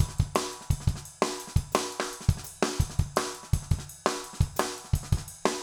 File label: jungle 170